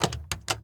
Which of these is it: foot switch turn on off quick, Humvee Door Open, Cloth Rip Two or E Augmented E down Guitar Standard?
Humvee Door Open